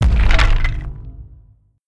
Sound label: shockwave; Smite; Cabrakan